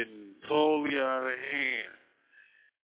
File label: slow creep